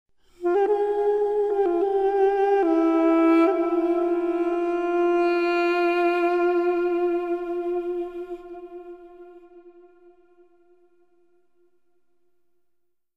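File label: theme melody; Myst III Exile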